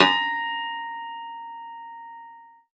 10th Flageolets, Piano, A3

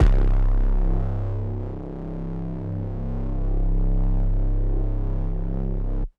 Synth Prophet Bass C; Electronisounds

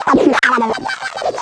Distorted Reality; Spectrasonic; DIGITERROR

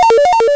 NOISE

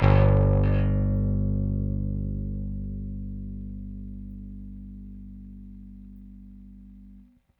Rickenbacker Bass; Airwindows

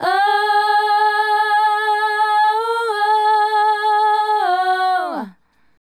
Female Group, Hip-Hop and RnB Vocals

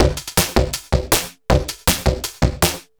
Vintage Kit, drums beats

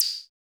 Drums; Distored Reality

